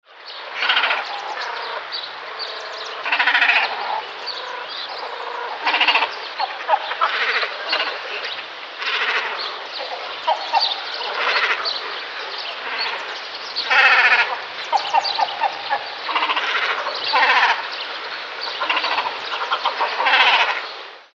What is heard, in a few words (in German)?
kormoran gruppe an nest